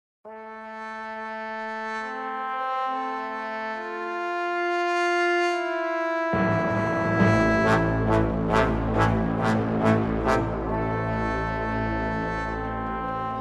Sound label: Solo Trombone; Zauberbrass